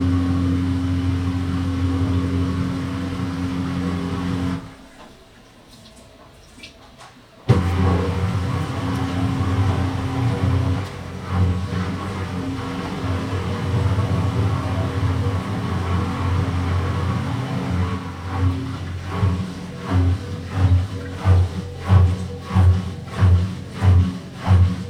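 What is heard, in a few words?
sound of child's sleep; Zvuk detskogo sna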